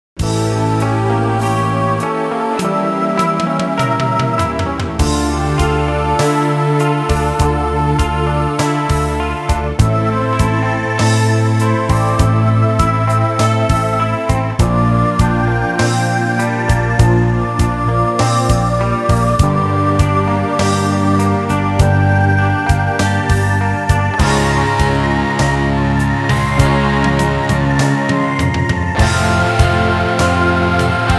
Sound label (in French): solo guitare